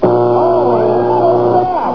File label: buzzer